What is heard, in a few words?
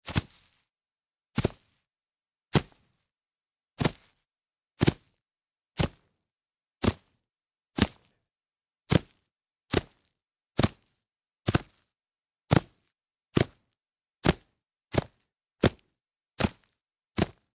grass landing